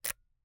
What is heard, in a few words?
Camera, Kinusa K77, 50mm lens, plastic, serrated, lens adjust, quick; Eiravaein Works; Vaeyan IV